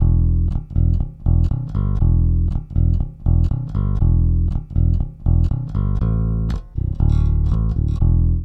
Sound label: Bass; LF Max Punch